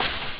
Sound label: hihat; Amiga Soundtracker Sample Packs